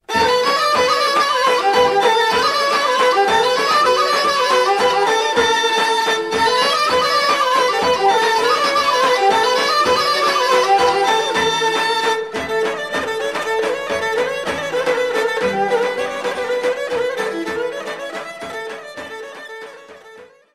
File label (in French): Pazardjik Kopanitsa, Bulgarie